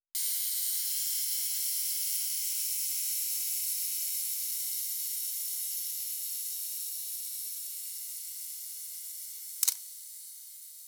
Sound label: flick camera